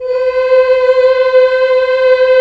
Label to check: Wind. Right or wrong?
Wrong